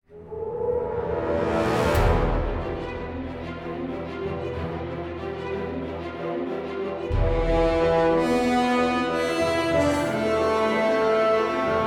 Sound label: Starfighter Music; Galatic Starfighter